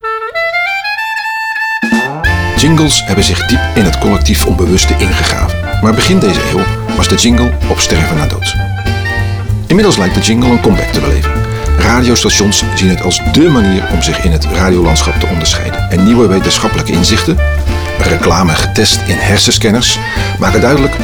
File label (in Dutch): jingle versneld